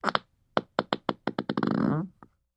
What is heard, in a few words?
Creaky Fart, farts